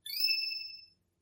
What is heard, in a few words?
smirking emote; animal crossing